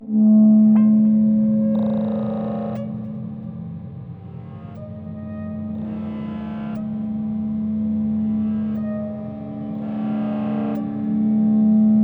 Loops mutantes

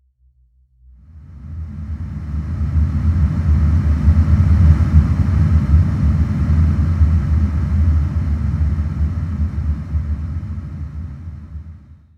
Loops mutantes

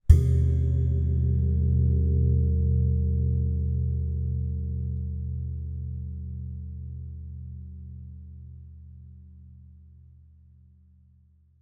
percusion

